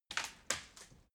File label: wood limb break